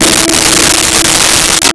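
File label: Jazz Guitar E5; Nintendo; July 2020 Gigaleak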